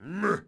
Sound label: Knight Fort Vox; Civ4 warlords